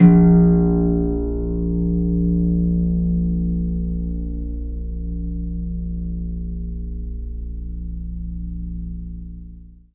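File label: HARP C2 SUS; ILIO; Synclavier Strings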